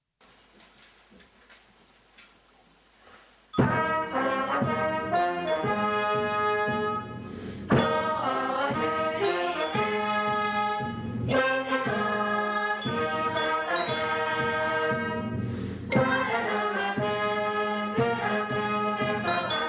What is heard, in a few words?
cheering song one chorus